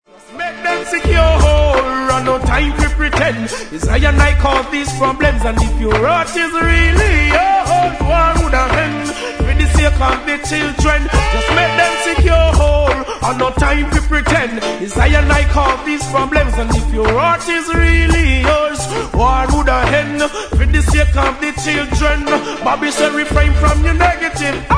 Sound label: Riddim